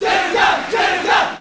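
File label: Crowd Japanese; The Japanese crowd chanting for various characters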